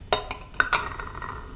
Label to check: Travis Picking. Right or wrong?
Wrong